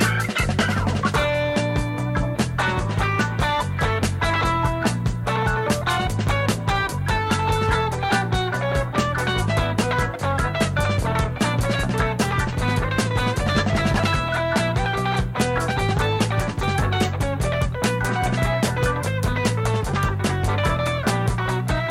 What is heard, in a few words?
green guitar